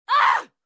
Woman Refuse Scream

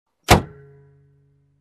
Closing Glove Box

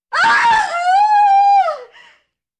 Woman Scream in fear

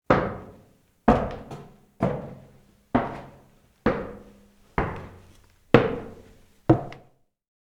Going up cellar stairs

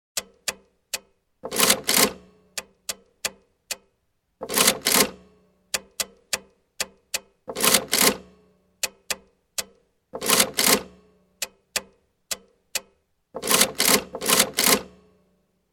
Typewriter sound typing slowly

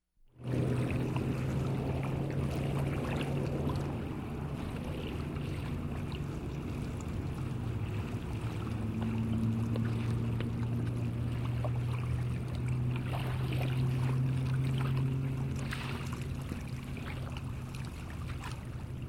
Driving through water ambience

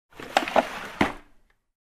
Opening Drawer

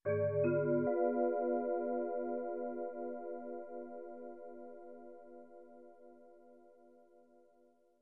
Ominous mallet sound effect

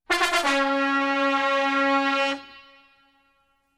Trumpets presenting sound effect

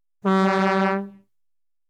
Truck Honk Noise

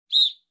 Traffic Officer Whistle Short

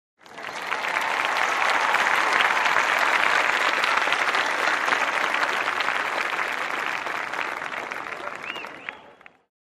Audience in a show clapping and whistling

